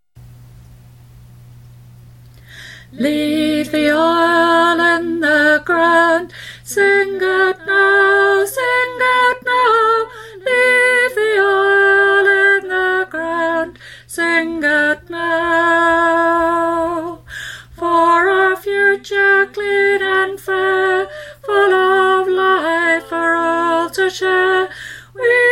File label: Alto